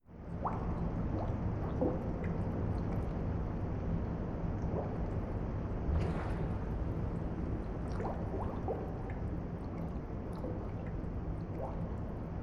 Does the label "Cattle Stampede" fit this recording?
No